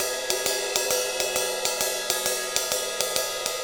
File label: Ride Cymbal Pattern; Essential Percussion; Ride Cymbal Patterns